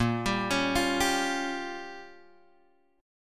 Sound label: A sharp Minor 6th, Guitar, Open D